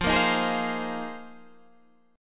A sharp 7th Suspended 2nd Suspended 4th

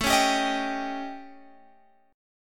A 7th Flat 5th Guitar Open G; 19,18,17,20,20,x

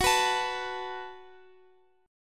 F sharp Minor Double Flat 5th, Guitar, Open F; F#mbb5 strummed; chord shape; x,x,x,13,14,16

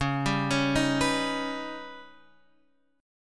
D sharp Augmented 7th C sharp, guitar

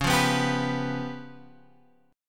C sharp 7th Flat 5th C sharp down Guitar Open G

